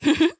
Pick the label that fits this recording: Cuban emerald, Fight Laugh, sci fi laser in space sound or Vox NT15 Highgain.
Fight Laugh